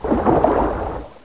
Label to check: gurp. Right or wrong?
Right